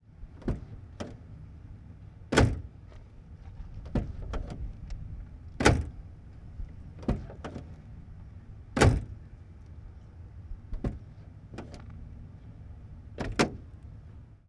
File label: 1947 Ford V8 Truck Door Open Close Multiple; Period Vehicles